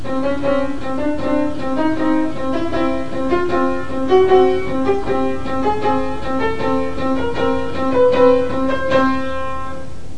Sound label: intervals